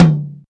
low mid tom d sharp key, tom drum single hit tuned to the D# note. Loudest frequency: 414Hz